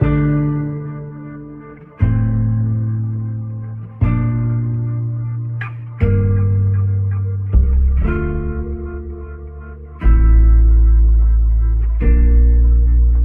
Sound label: guitar, Loops